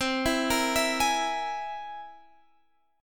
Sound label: C Augmented 7th, Guitar, Drop D